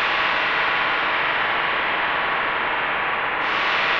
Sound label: sparse soundscape samples; ReLooped